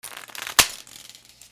BREAKING

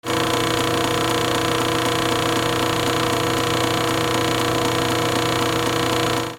INDUSTRY

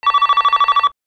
PHONES

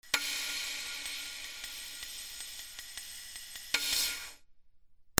RHYTHMIC

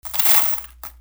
MONEY

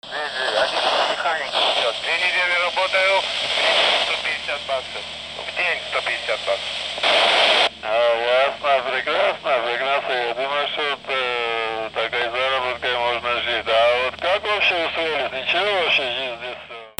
BOATS

